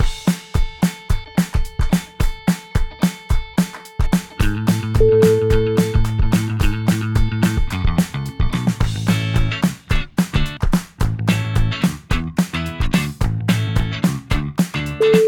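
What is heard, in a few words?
comic rock, music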